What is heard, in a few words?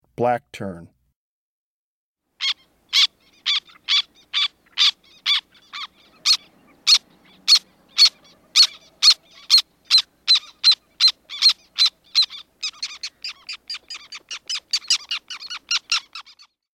Black Tern; Breeding Birds of the Chicago Region